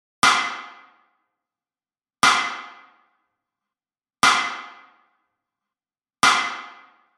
Impulshall Mechanisch Sidestick

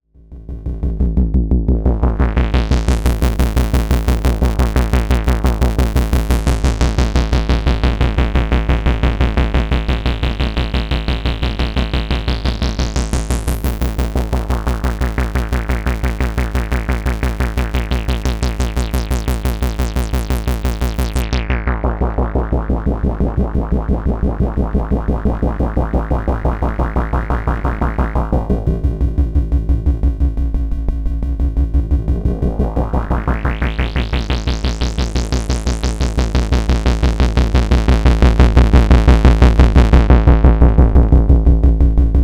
MOOG Mavis Filterspielereien ueber gated Beispielpatch; Who needs a sequencer

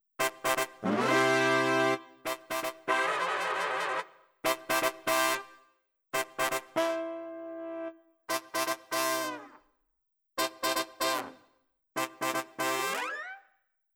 artikulationen

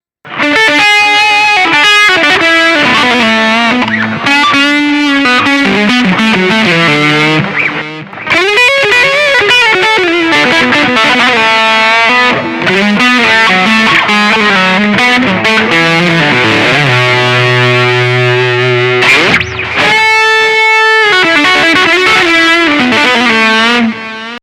volle zerre lead; pitbull

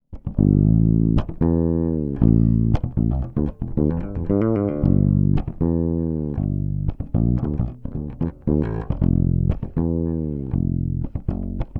Bass Kuma Fretless 5 Groove Two Single Coil Tonblende offen

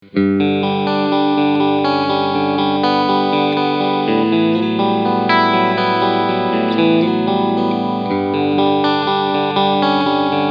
Harley Benton Fusion II HSH Cleansound HB SC Modus

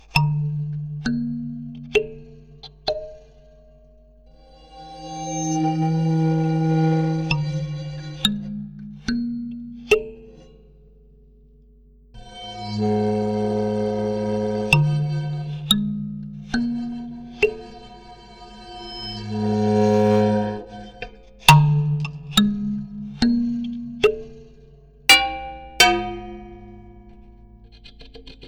Soundbox Mk2 Improvisation mit Allem

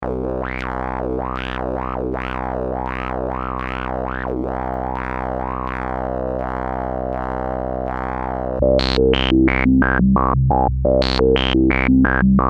treppenmodulation

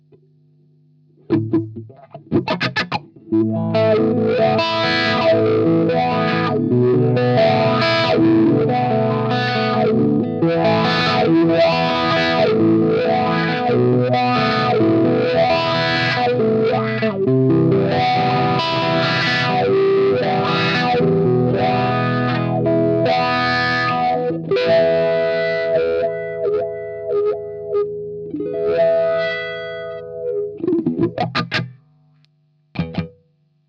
Cry Baby im Vergleich

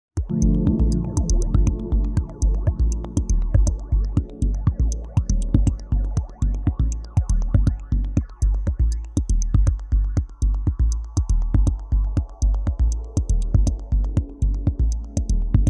Behringer Dual Phase Komplexes Signal

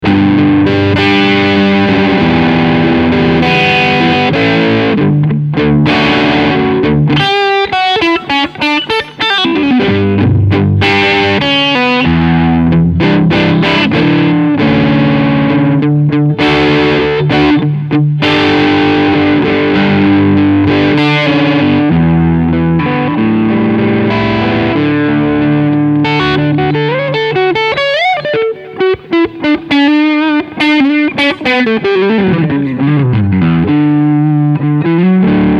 Beide PUs Crunch

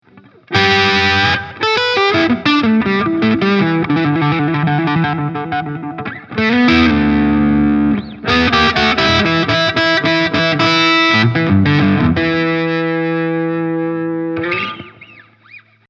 FGN J Standard Iliad 664 Hals Gain

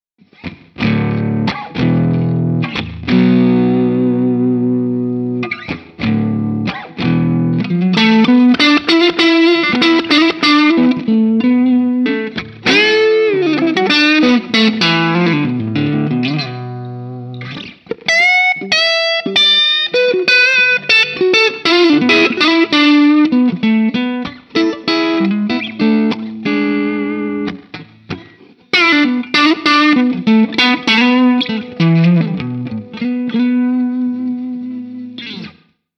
Valeton GP 200JR 50 PLEXI Halspickup

